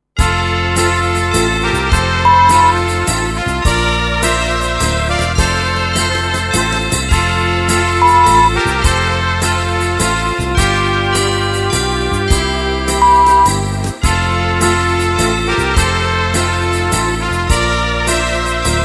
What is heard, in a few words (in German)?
XMas Walzer C Trompete 104bpm; unbeschwert